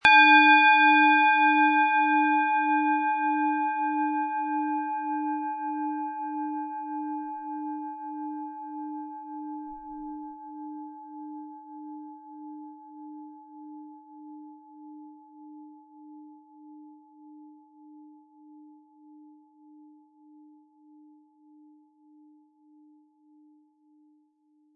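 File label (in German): Planetenschale inkl. Klöppel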